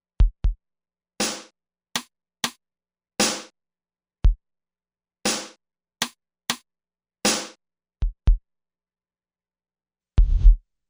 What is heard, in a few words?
NON LINEAR INVERSE